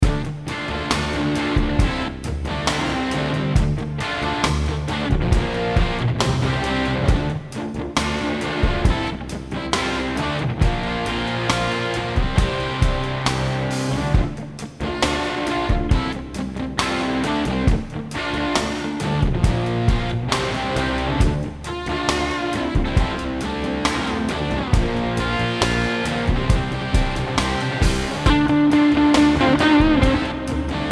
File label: D minor jam